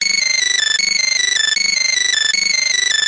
science fiction data stream harsh; zapsplat

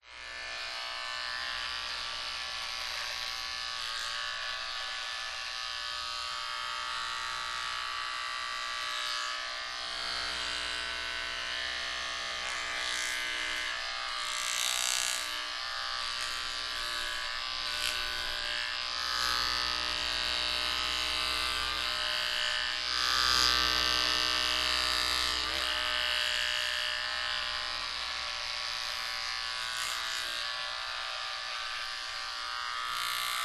shaving electric razor wide stereo pov

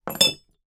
bell school handheld put down on table

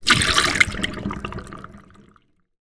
food drink cup tea tip away sink; zapsplat

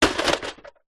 robot small android metal fall over; science fiction

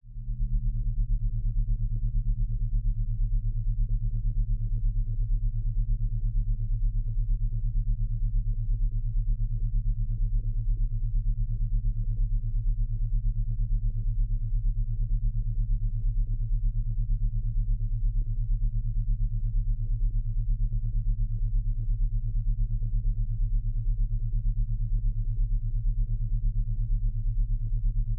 science fiction low frequency force field; zapsplat

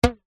elastic thin stretched ping; zapsplat; foley

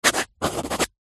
pen rollerball sign signature paper pad; office

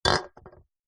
beer can empty set down table

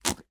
cartoon pull squelch suck

